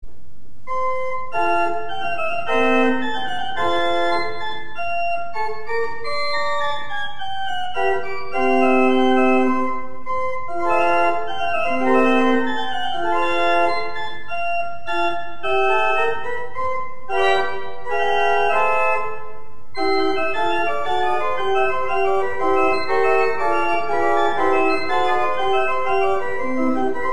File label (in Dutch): orgel; J. Haydn; Floetenuhr 1772